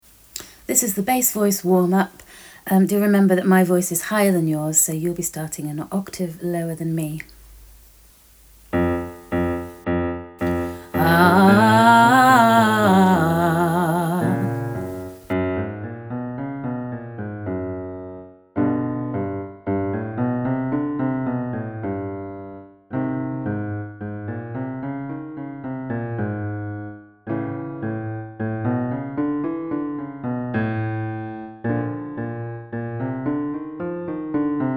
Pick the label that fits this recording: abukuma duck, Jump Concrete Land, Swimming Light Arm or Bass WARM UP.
Bass WARM UP